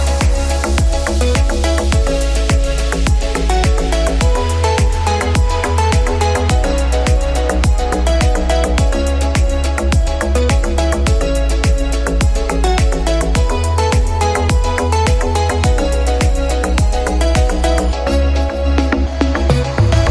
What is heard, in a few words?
Honor 7C Ringtone